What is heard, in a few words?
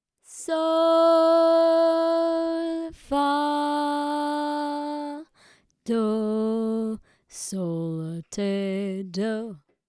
Sol fa do in A Minor